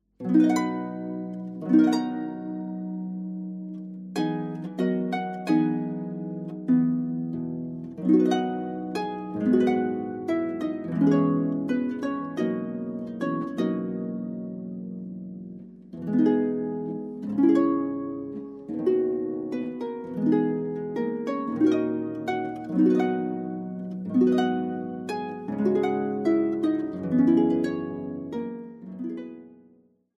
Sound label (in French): Sarabande pur Harpe